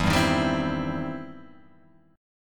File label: E Minor Major 7th Sharp 5th, Guitar, Open G; 2,0,1,0,1,2